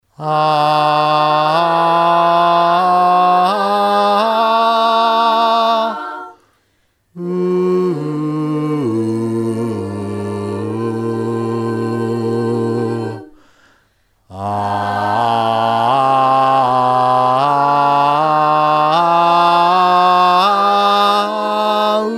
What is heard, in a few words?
Bass, parts